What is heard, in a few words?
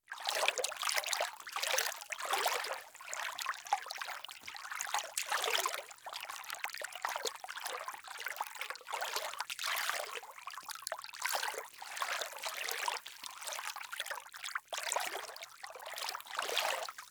Wat Lapping